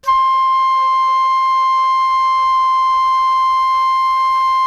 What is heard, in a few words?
FLUTE MF C 5; Greytsounds - Philip Wolfe David Crozier's Saxophones